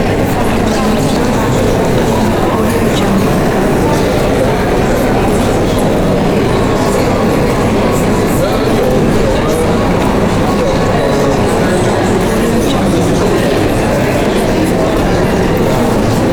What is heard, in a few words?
indoor crowd ambience loop